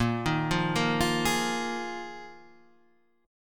A sharp 7th Suspended 4th A sharp, Guitar, Standard; arpeggiated; 6,6,3,3,6,4; chord; JGuitar